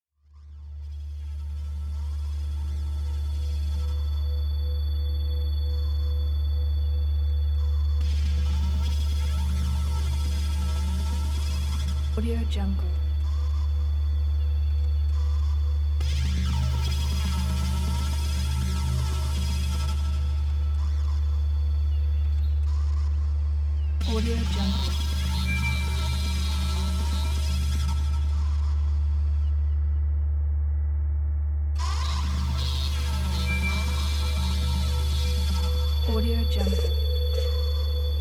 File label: dark scifi industrial horror background